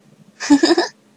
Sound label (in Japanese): "ふふふ"